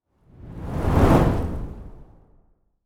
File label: whoosh